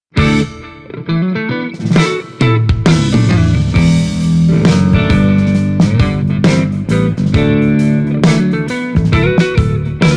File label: Celestion Greenback G12M Stratocaster